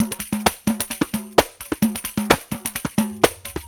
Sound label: Tar, World Groove; Ambient Grooves